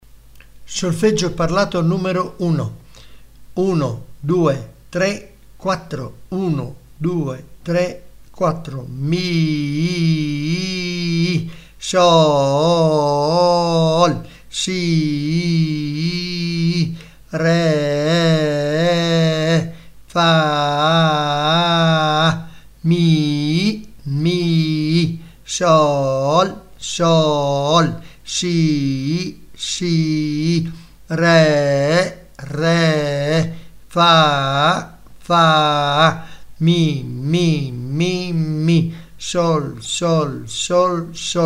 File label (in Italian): Solfeggio Il mio amico flauto